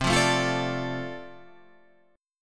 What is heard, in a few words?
F 6th C, chord